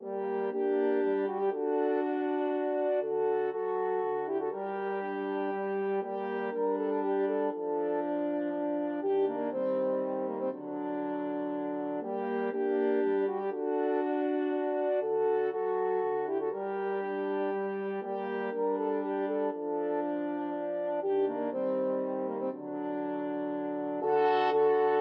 french horn trio

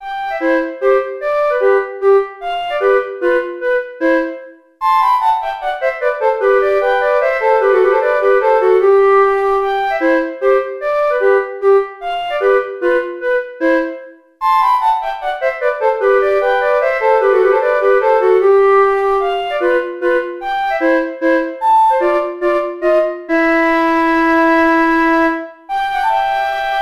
Celebrated Waltz, flute duet

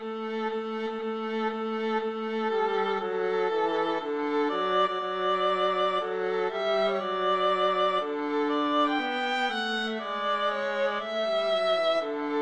violin viola duet

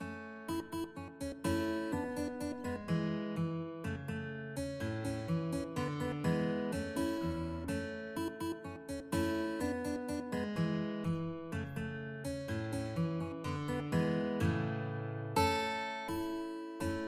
banana man guitar

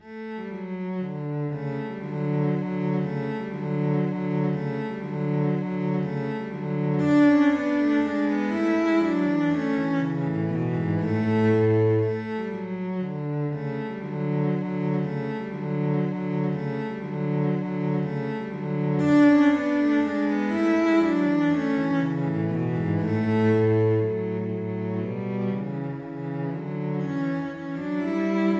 minuet, cello duet

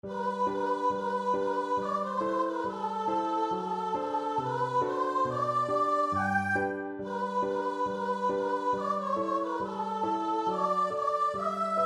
soprano voice; schubert rose

